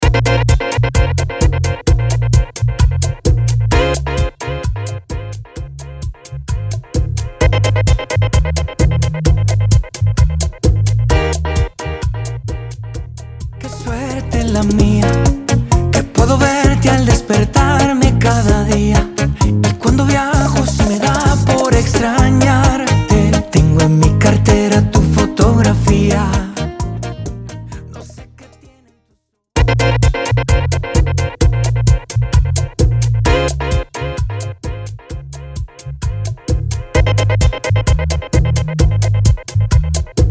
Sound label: Bachata, 130 BPM